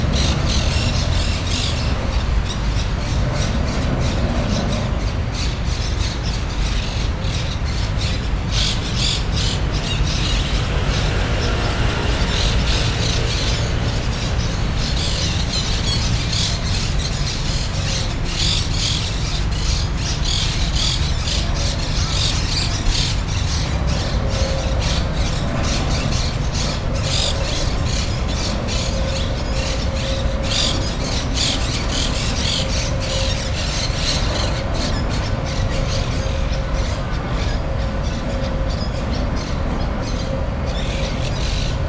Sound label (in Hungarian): szoszatyar hullamos papagajok